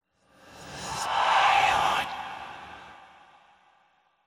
Whisp Tense; horror and atmosphere fx; Silent Hill Downpour

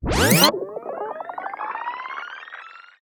Projector Tape Reel Stereo; Silent Hill Downpour

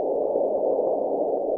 Wind Indoor Eerie Loop; weather fx; Silent Hill Downpour

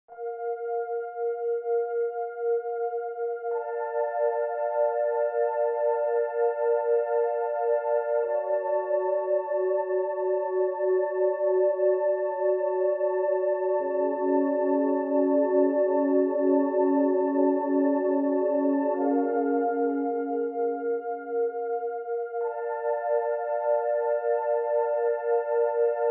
Suspense Buildup

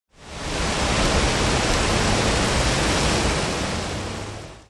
Wind; Silent Hill Downpour

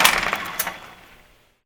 Projector Start; Silent Hill Downpour

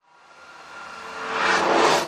Metal Scrape Verb Reverse; horror and atmosphere fx; Silent Hill Downpour

